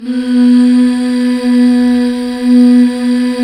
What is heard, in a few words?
HAL MMHH, Back. Mmh Fem